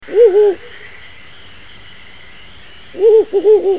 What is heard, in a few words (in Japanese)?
fukurou